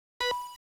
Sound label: signal; arc signal1; OutRun